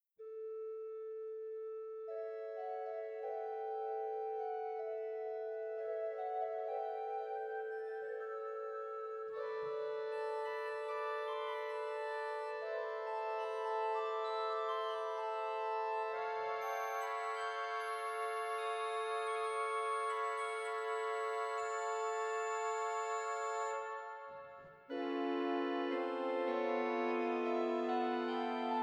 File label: Crescendo, organ; Organteq